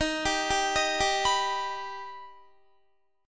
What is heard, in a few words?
D sharp Augmented D sharp Guitar; D#+ arpeggiated; 22,22,19,20,19,19